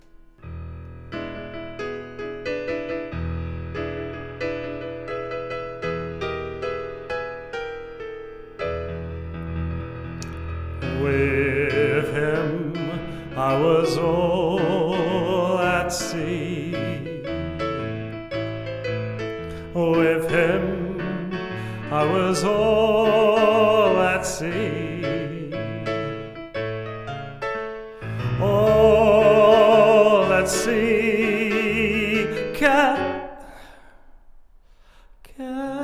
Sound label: vocal